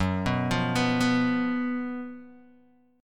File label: Gb Major 7th Suspended 4th, down, Guitar, Open D